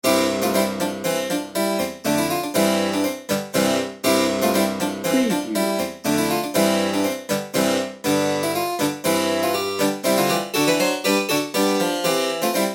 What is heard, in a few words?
deck the halls